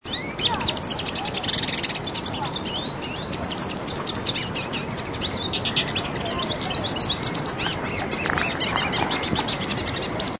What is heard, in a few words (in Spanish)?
voz cabecitanegra común